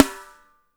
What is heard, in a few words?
SNR; Spectrasonics; Burning Grooves